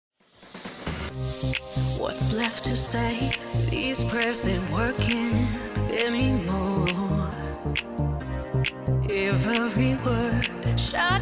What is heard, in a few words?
edm ashes